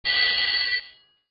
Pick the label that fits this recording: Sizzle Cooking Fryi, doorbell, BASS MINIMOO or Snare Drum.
doorbell